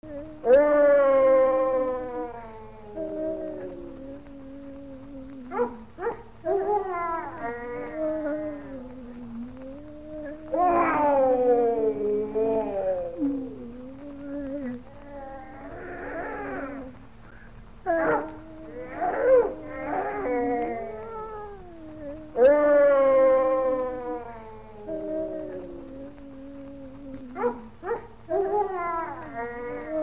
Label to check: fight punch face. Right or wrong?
Wrong